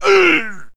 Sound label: man hit; Mount&Blade Warband